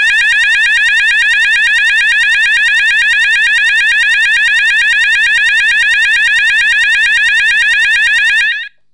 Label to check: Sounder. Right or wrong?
Right